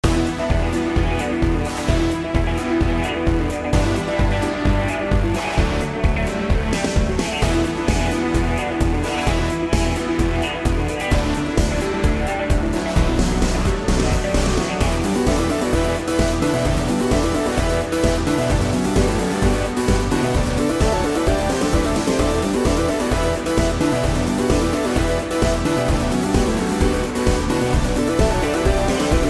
Dance (Alternative); Hollow Dance

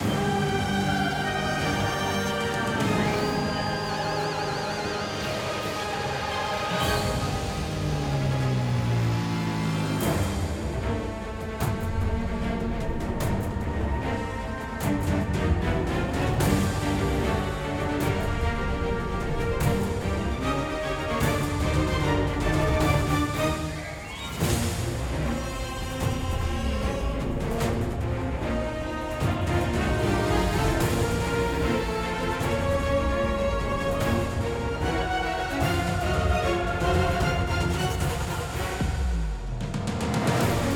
Battle Boss; SevenNightsFireEnnio Phase 01

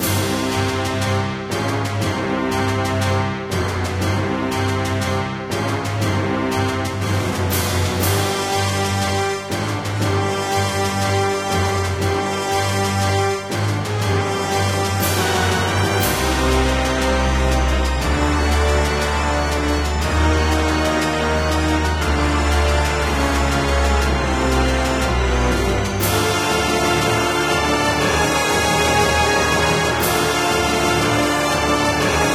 bgm event

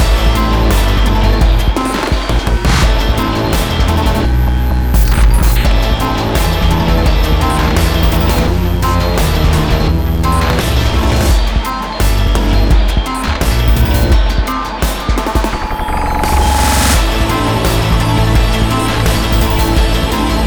Boss Wavefight; rive wreck hack die retry; ps4

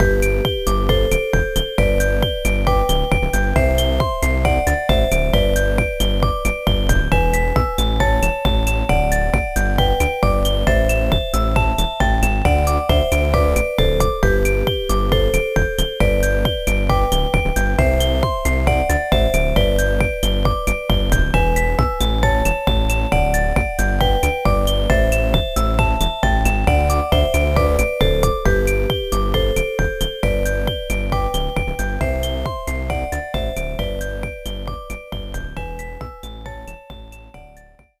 BGM menu; Jewelpet Kawaii Mahou no Fantasy; BGM TSUSHIN MENU

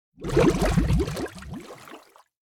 submerged

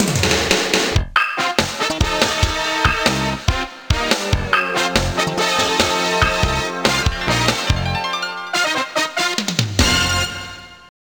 Stage Failed; Gals Panic 3; arcade

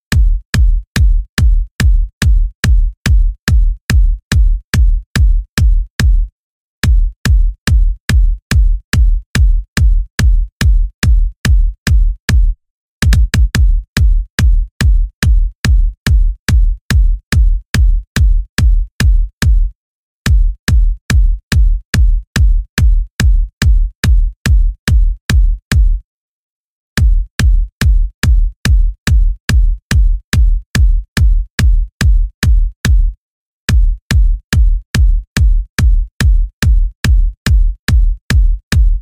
bgm classic kick; Pac-Man Championship Edition 2

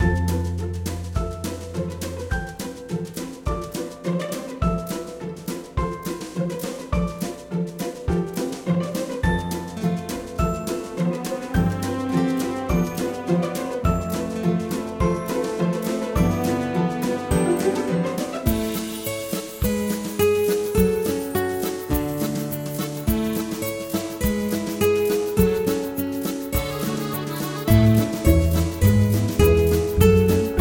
Restaurant

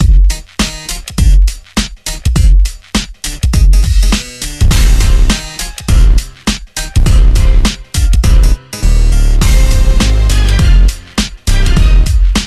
Hip-Hop Track; Asphalt 3 Street Rules

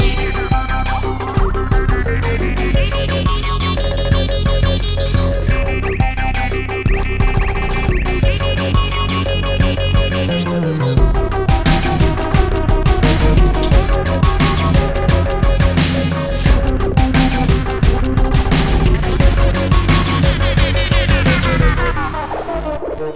Asteroids Inside Fast; rive wreck hack die retry; ps4

